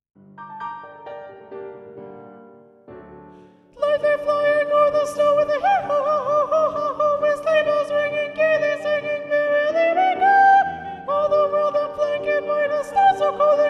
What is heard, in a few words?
SSA soprano 1 predominant; a la russe